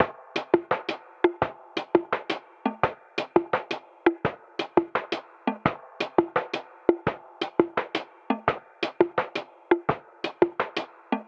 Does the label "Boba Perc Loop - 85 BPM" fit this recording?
Yes